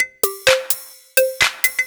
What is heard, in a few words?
Electro Loop 128BPM; Vengeance Samples